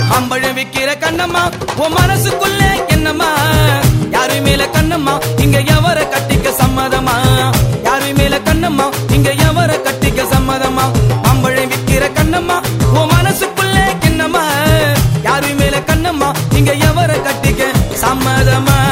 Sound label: Tamil Gana